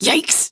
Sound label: hit